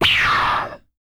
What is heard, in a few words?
BLAST, MALE PERC; Spectrasonics Vocal Planet; Jazz FX